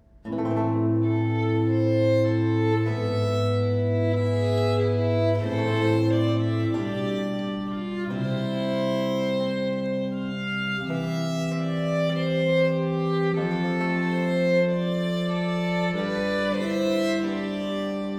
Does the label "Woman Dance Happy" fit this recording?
No